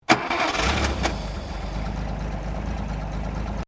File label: Car Holden FE Bonnet Open Ignition; Classic Australian cars; Vehicles; SoundEffects-Foundation1